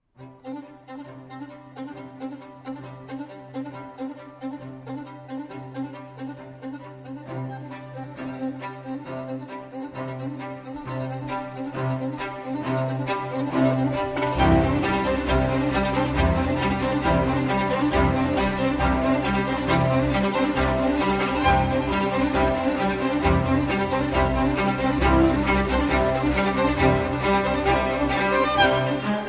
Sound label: Solo Violin, Piano, Strings & Electronics